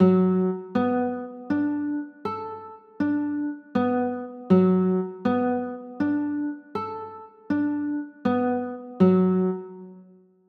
b m7, chords; pos 7

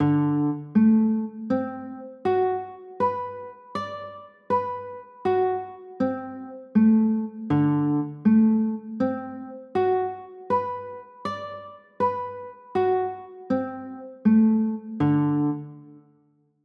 d 13; chords

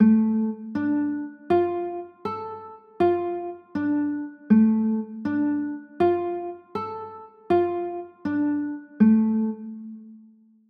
d m, chords

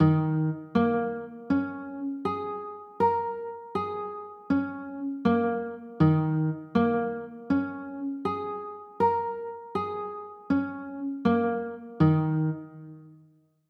d sharp 7sus4, chords

